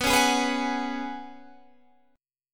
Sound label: F sharp Suspended 2nd Suspended 4th B